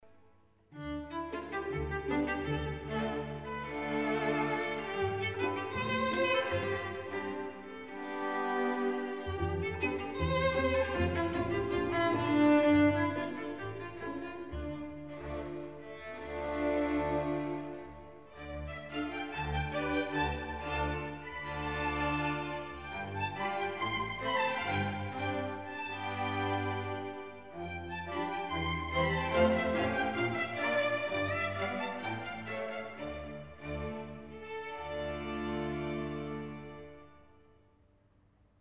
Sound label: Seven Romanian Folk Dances for orchestra; Bartok